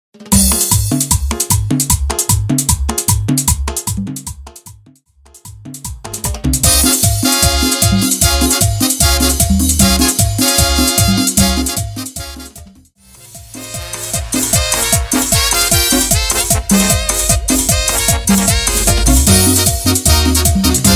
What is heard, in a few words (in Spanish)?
Intro Saxo, 152 Bpm